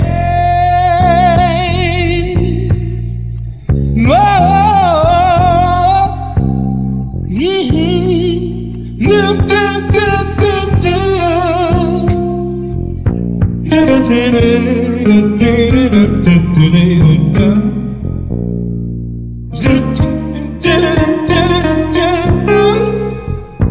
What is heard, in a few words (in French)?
chorus gui voi bass, Chorus principal avec guitare / voix / basse; batterie